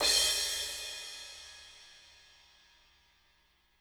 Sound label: CRSH, CRASHES